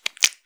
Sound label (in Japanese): ゆっくりめ, ぱちゅ音単発